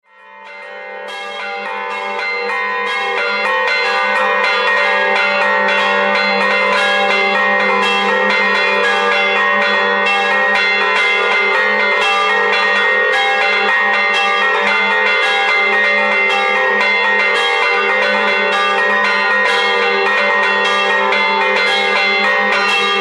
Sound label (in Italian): Comerzo, scampanotata tagliata, campane